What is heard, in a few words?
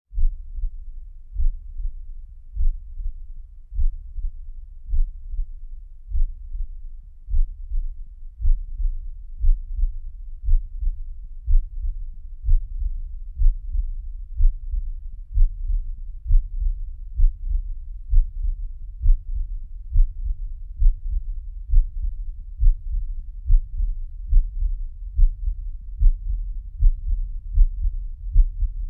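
pulse oximeter sounds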